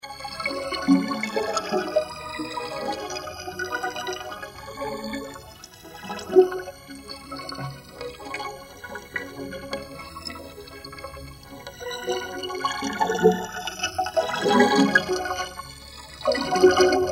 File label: melodized water lapping